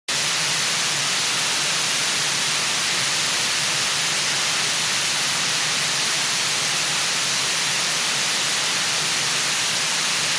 analogovij sintezator arp odyssey

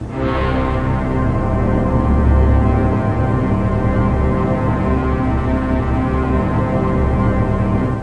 orkestr nizkij prodolzhitelnij akkord

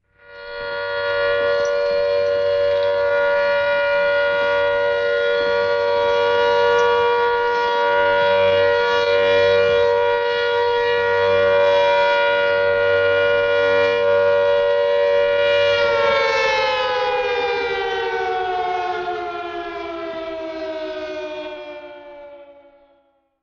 voennaya trevoga sirena

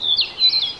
korotkij oglushayushij svist

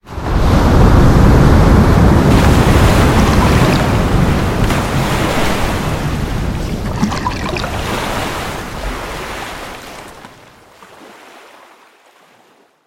myagkie volni laskayushie bereg

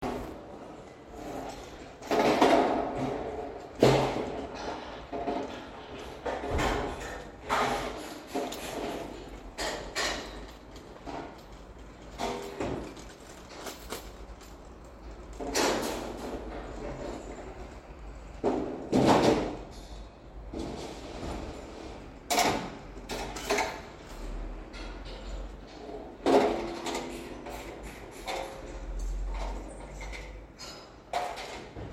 lyudi stroyat lesa

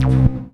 knopka cifrovoi melodichnii nizkii

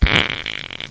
pukane

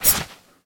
vzmah dlinnoj ostroj sabli

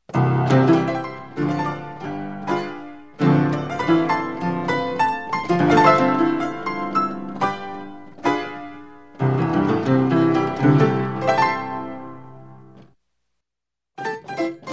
zvuki igri na fortepiano

